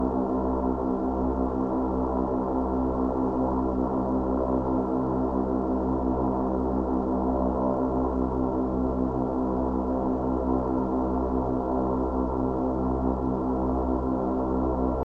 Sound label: Ambient Security; Among Us